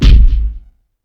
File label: CATCH SUCCESS; UFC Tapout; XBOX